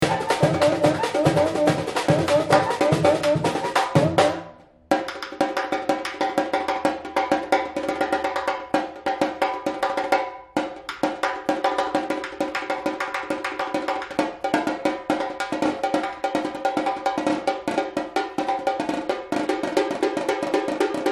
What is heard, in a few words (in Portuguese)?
repinique